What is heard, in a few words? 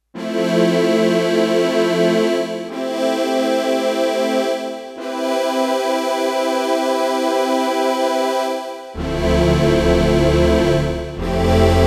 Brassy Vox, Roland D-10; Factory Patches